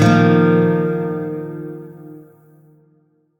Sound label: Shawzin Blues Chord E; Warframe; Lotus Shawzin